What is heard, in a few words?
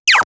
laser pistol slight charg; arcane